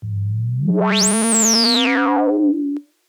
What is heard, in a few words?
Monotron Sci-Fi